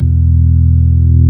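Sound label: ORG B3 E 1, organ; Best Service Gigapack 2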